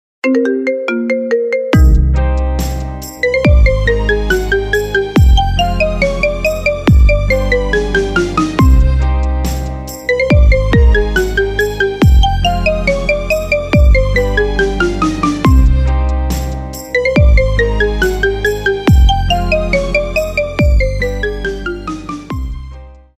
Nachfolge Marimba

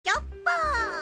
Chopper

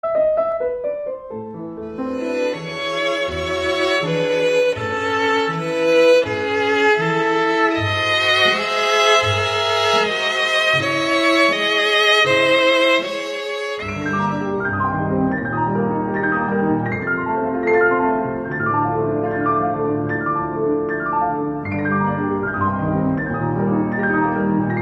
Classical

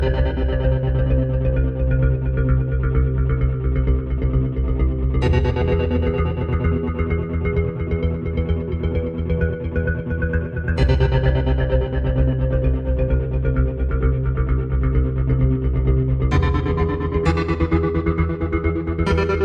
Samsung Noteeffects

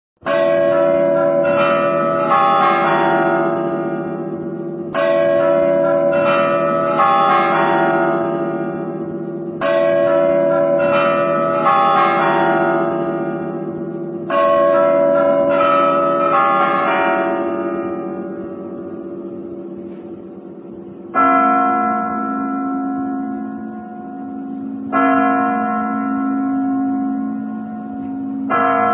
Chiming Clock

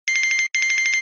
Cubicle Sms

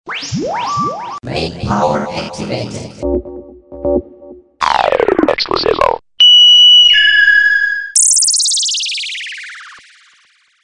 Oppo Low Power

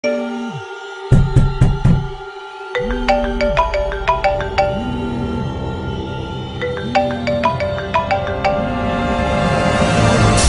Iphone XR Simplae Ring

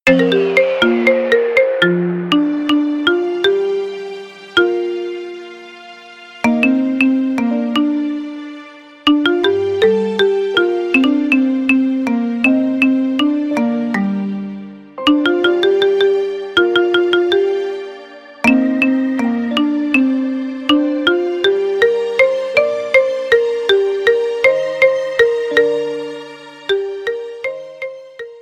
Marimba

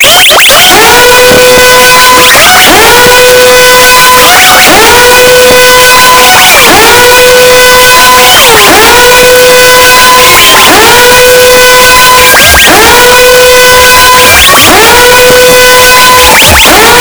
Extremely Loud; Alarm Kategorie; Klingelton

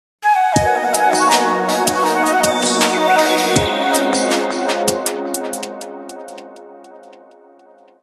Nokia N91 klingelton; Nokia Kategorie

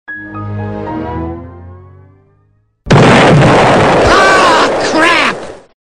Explode; Windows Xp

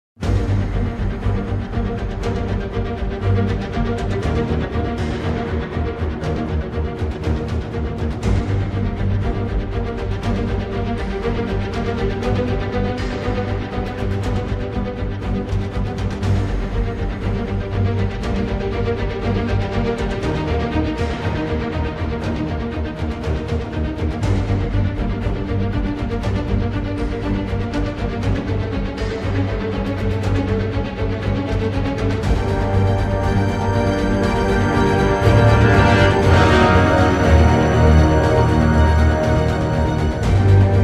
Action Strike

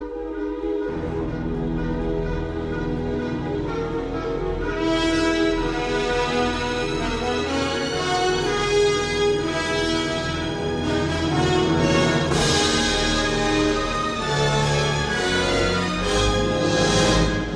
Film Intro; Cinergi

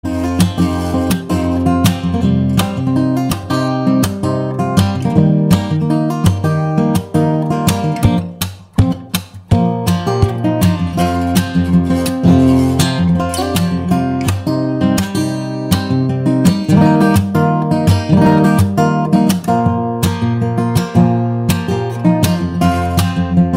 Guitar

